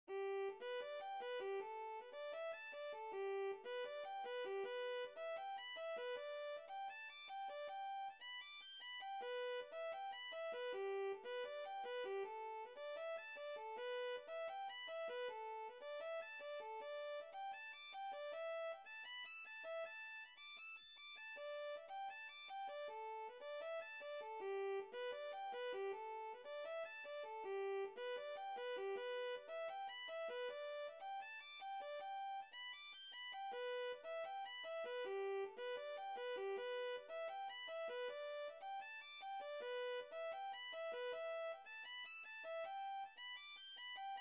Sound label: fiddle tune